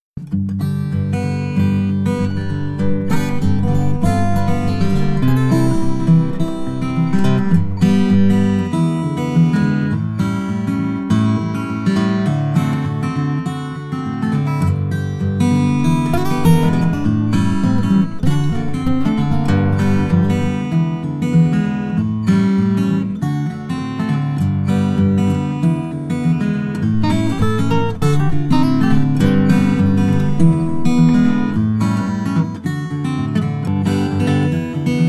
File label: music